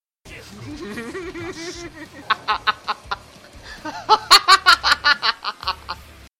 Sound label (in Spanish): jajajajajja